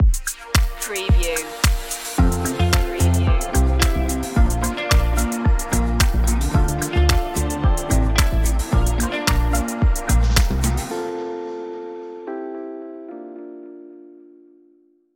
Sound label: summer fashion house